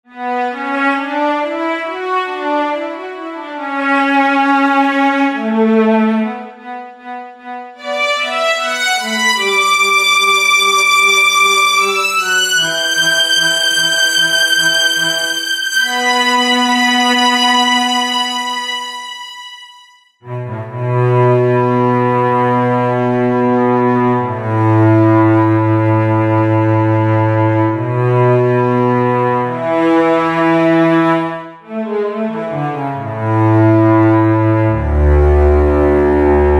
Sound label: strings; HALion6 : Studio Strings